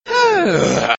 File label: reverse; tim allen